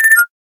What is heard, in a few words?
discovered tag notification; Samsung Galaxy S8